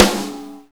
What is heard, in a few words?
normal hitnormal; osu!